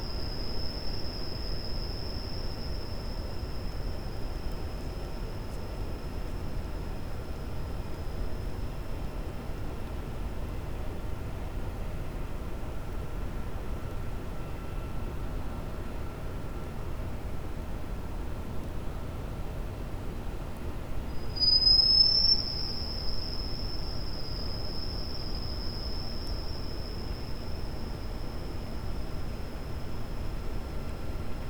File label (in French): cachalot negatif, Physeter Macrocephalus